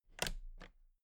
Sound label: toolbox latches close soft double; industrie wirtschaft; handwerk